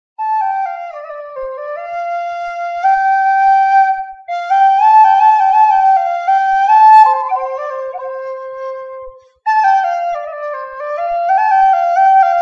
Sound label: Whistle